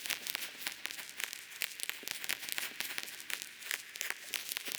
Cracklature, sparse soundscape

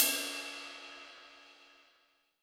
ride, Zoom MRT-3B

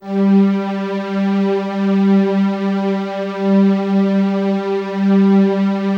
LUXURY G4, LUXURY PAD; Drums In Your FACE!

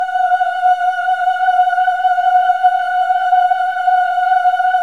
VIB M F#5 -R, Symphony of Voices; Spectrasonics

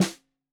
Snare 65 Reg Stereo, Deeply Sampled Drums

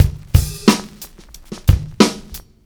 JZ 90 DRUM, ACID JAZZ; Best Service; Advanced Media Trax Modern Composer

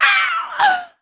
"auh", shouts; Best Service; Hyper Dance

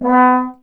Tuba; Miroslav Vitous - Solo Instruments VOL-2

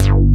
JX BASS; Pro-Rec - Synthsonic; TRINITY

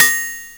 cymb, cymbals

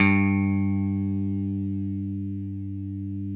6STR BASS, Country; Sampleheads; Whole Lotta Country 1 & 2

